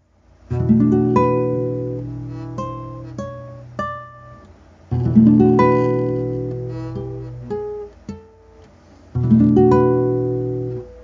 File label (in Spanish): ejercicio avanzado de cejilla, guitar